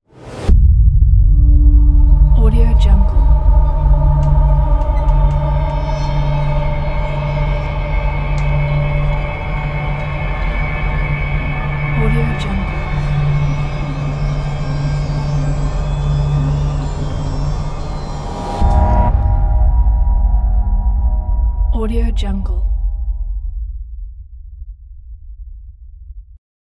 suspense atmosphere

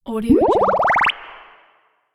bubbles

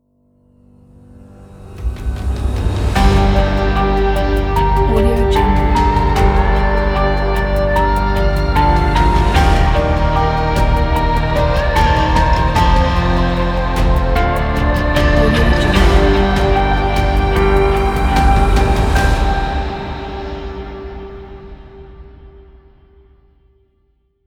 epic dark logo

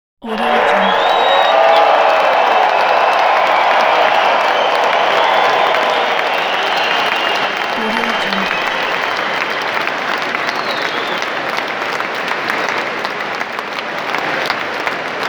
crowd applause cheering clapping sound

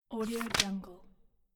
page turn; brabcaque

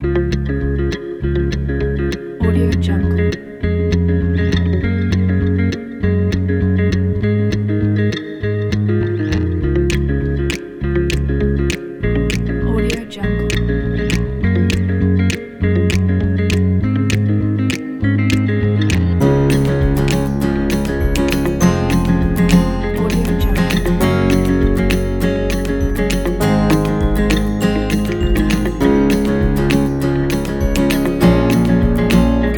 happy folk acoustic

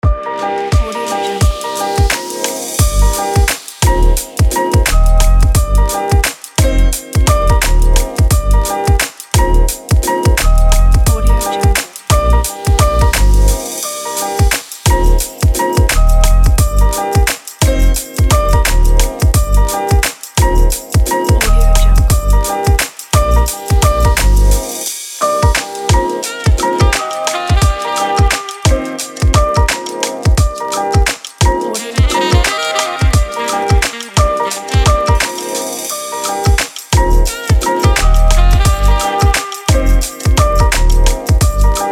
the coffee shop kit

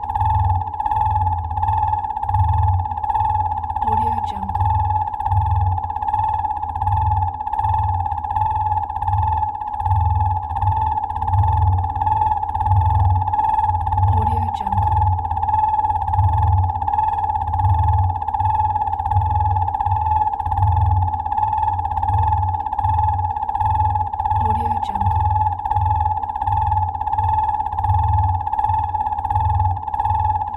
pulsating rubbing squeak

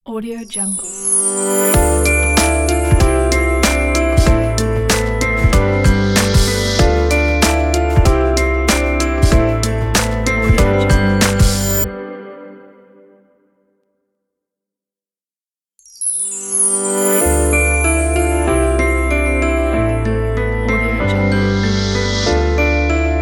modern podcast logo ident

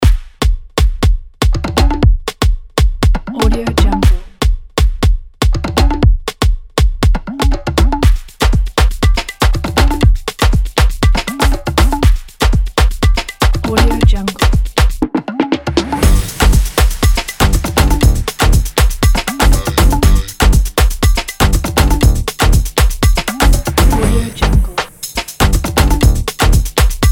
brazilian and reggaeton

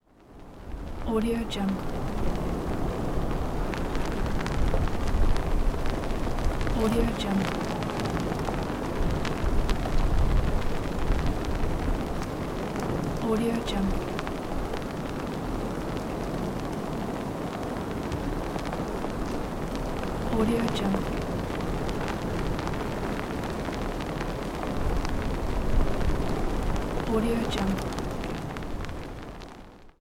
flag

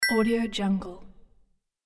tinkle shot

